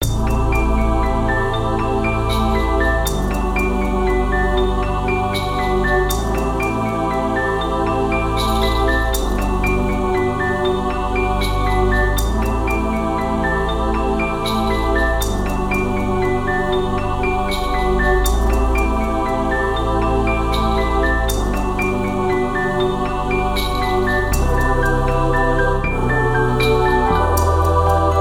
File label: Winter Christmas glittering BGM